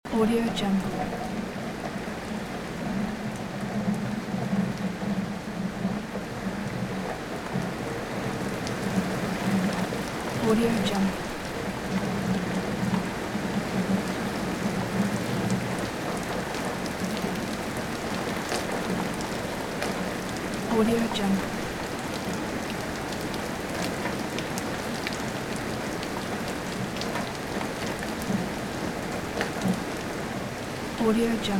strong rain on village house yard loop

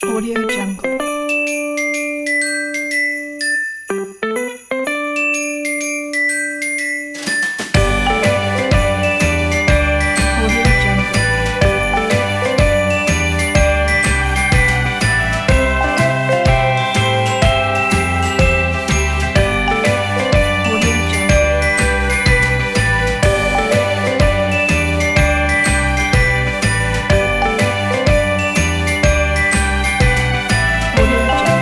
vintage christmas; russell-frederick

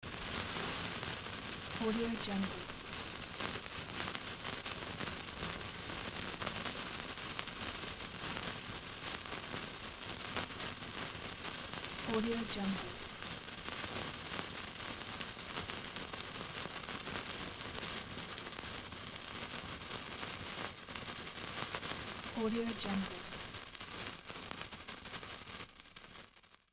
sparkler burning

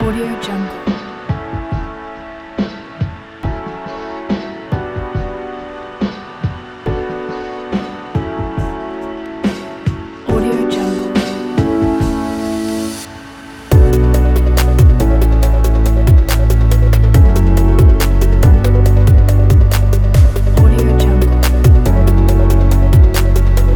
emotional chillstep

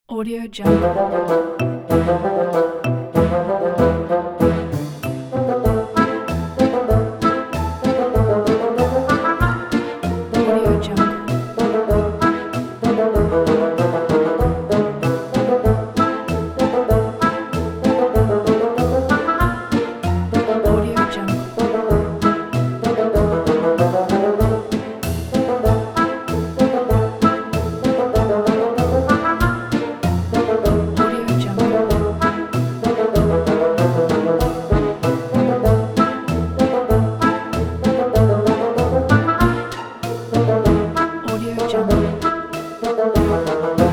cartoon character hedgehog

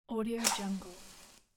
matches lit up

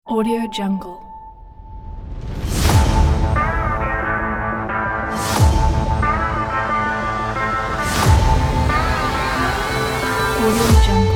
epic sport trailer

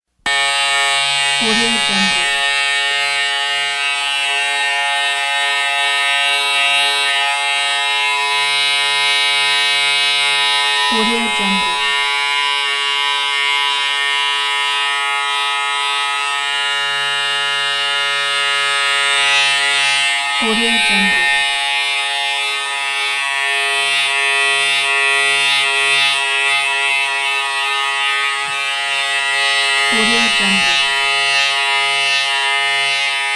electric shaver

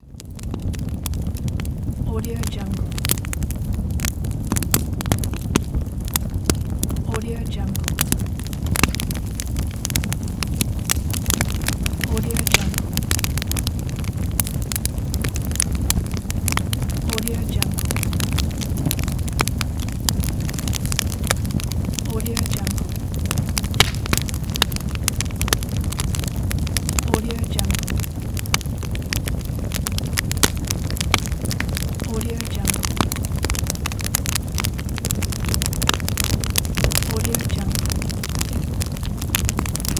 fire crackling heavy crackles; soundjay

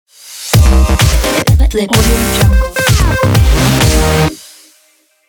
electro house logo

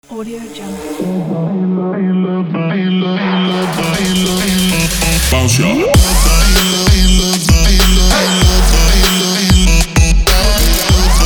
in trap

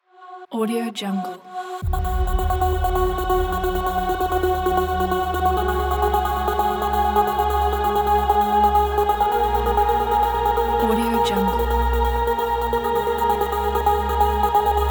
epic trance

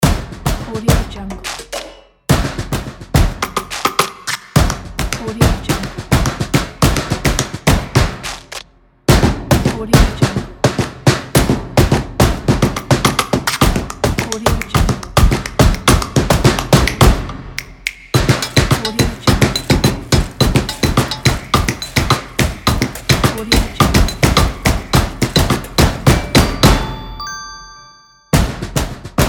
strong intro logo

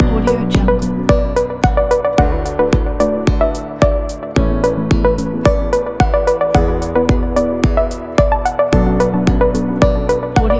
action corporate kit

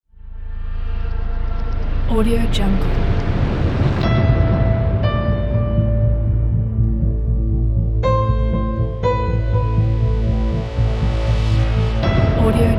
serious technological lyrical sad background

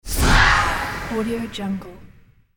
eerie jet airplane whoosh; hollywoodedge

